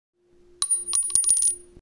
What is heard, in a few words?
bullet shell casing falling on floor